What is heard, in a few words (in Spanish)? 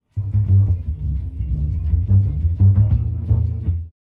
BAJO